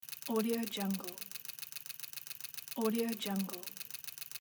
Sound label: slot machine spin loop